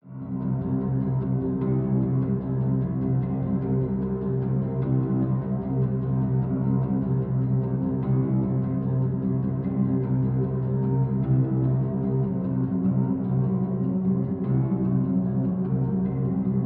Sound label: nylon something off C minor 79 BPM